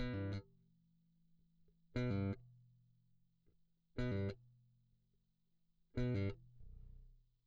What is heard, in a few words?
bass